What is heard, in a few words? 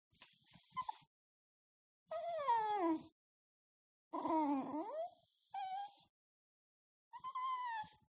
DOG Whimper Really Sad; ANIMAL; smartsound